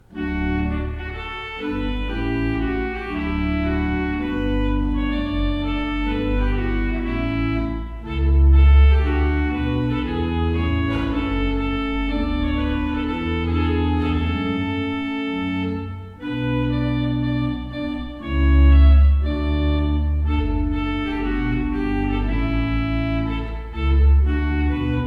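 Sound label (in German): Orgel, pfeifenorgeln; Conacher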